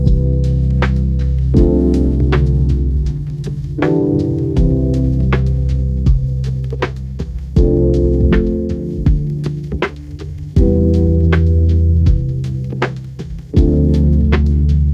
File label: lo-fi hip hop